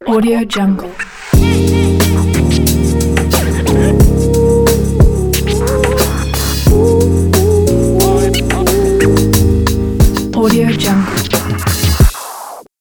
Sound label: lo fi hip hop vocal